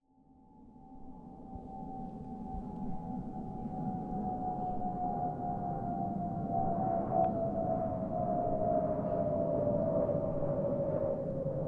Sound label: airplane pass by; spoteffects